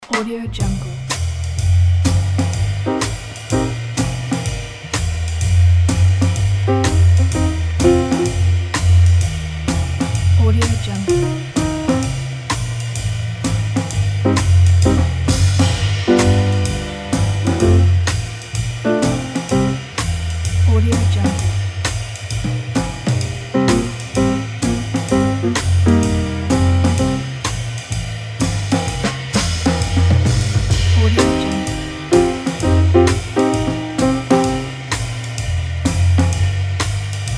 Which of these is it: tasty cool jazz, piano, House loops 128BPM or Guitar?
tasty cool jazz